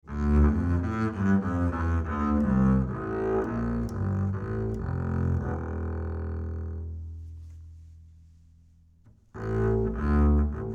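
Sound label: acoustic bass Bowed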